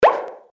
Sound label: drop, Misc Percussion Pop